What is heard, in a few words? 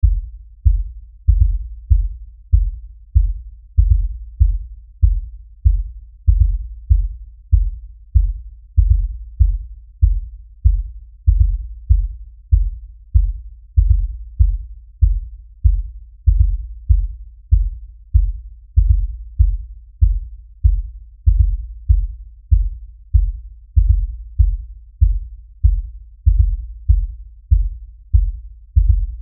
Lfe Beat Low Bass Pounding Rhythm; Miscellaneous Musical; Blastwave